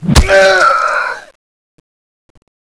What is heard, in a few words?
zombie attack